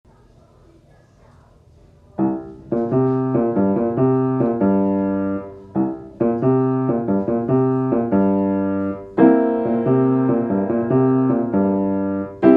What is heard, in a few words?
A Neat Beat